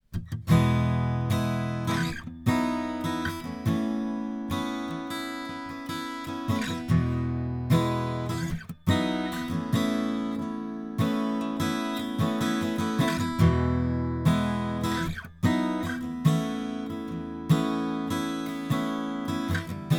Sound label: Open Chords; Samar MG33; DPA